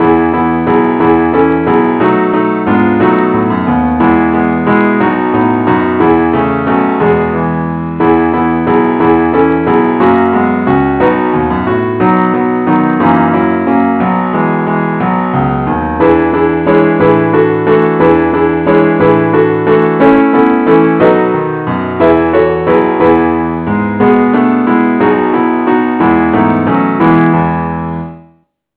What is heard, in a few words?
angel voices keyboard only key e